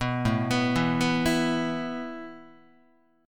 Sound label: A Suspended 2nd A Guitar; Asus2 arpeggiated